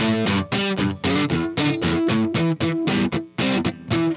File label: Riff Guitar, Indie Rock; Sonic Foundry (Sony Creative Software); Indie Rock Anthems; Session 06 - Haze